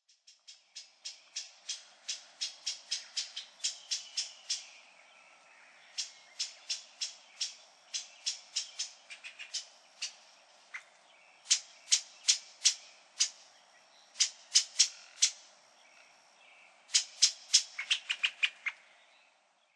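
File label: Brown-headed Thrush; akahara; nikko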